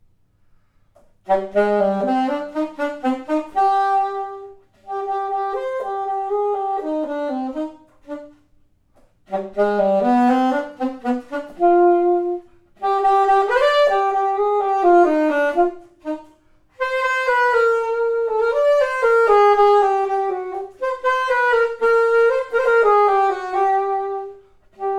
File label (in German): Alt Saxofon; Klangproben Instrumente